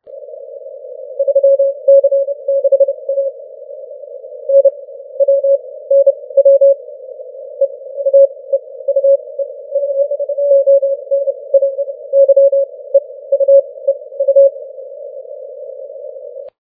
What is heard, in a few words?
30m cw